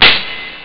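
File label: Hi Hat Reverse; jazz jackrabbit 1; M8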